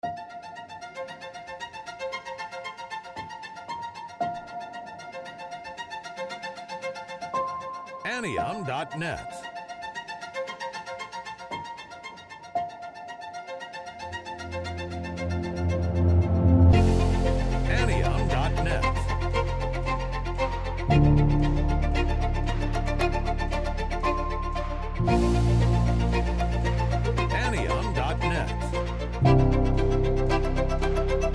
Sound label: flickering violin